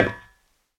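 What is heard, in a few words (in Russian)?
глухой металлический лязг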